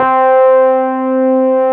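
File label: Wurlitzer C3 - L; USB Soundscan vol.09; AKAI; Keyboards Old School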